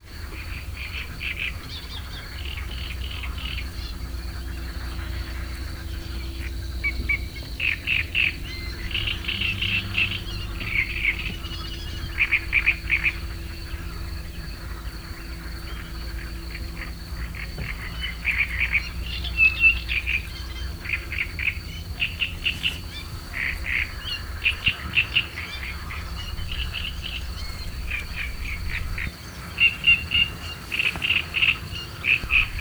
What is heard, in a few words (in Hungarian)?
cserregő nádiposzáta és kakukk is, bölömbika; termeszetben